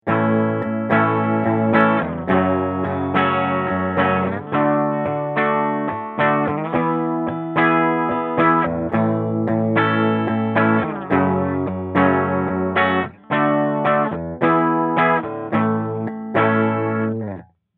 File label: Hot Rod Deluxe, guitar